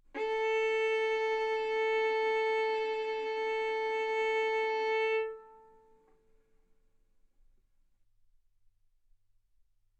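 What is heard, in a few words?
Violin arco mf A string A4 B4 stereo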